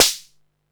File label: snare, techno 138 BPM